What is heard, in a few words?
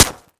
asphalt small